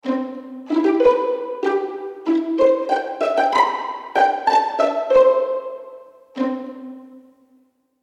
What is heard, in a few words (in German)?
Pizzicato Violinen